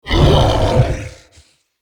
Corrupted Bromius Melee Attack Growl; Animals; Vocals

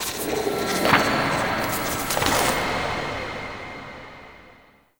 Paper Scribble; Ambience; Echoes; Warframe; Entrati

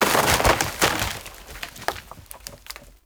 Forest Tree Fall; Warframe; Awakening Remaster

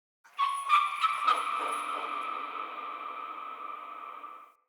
small creature; Ambience; Under City

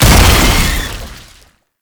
Explosion; Tank Technocyte; Warframe; Lasrian Tank

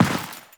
Step Dirt, Footsteps; Warframe; Teshin

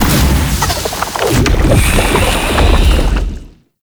Octopede Lash Limbs Charge, Enemies; Warframe; ManInTheWall

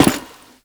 Step Default; Warframe; The New War; Characters; Veso

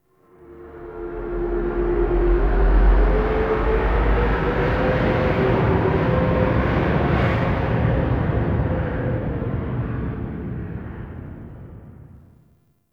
Ship Platform Delay, Dropships; TheTeacher

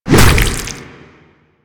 Infested Ancient Tentacle Swing Hit; Warframe

